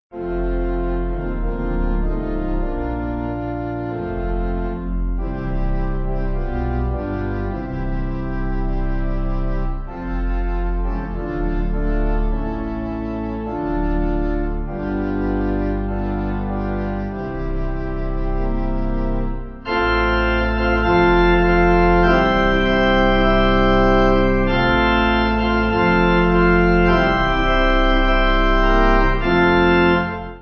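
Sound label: Pipe, Pipe Organ backing; W.H. Doane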